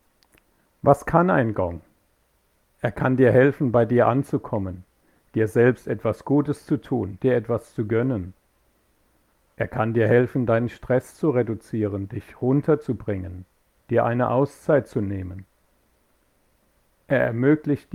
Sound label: gong